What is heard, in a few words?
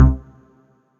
DM BAS, Dance Mega Synth; Best Service